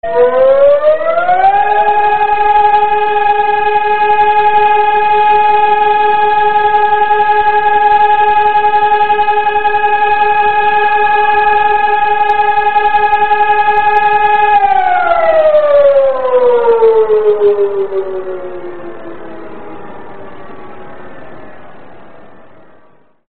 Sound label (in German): sirene probe